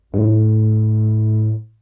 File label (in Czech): tuba